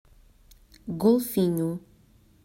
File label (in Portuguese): Golfinho